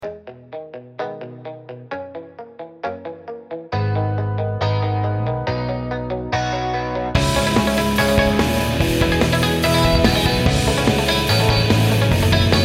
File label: Last-minute "now or never" style rock track